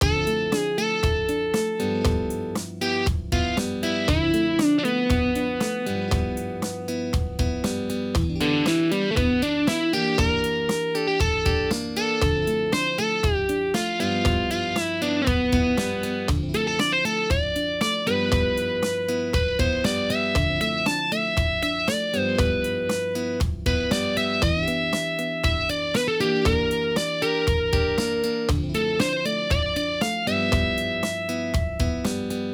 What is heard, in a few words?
SOLO